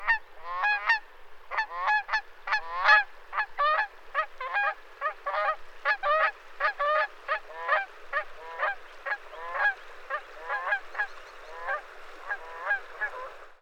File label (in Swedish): Kanadagas; Canada Goose; Branta canadensis; Chris Parrish